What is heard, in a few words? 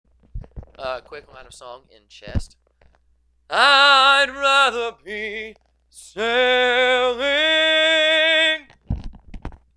Chest - I'd Rather Be Sailing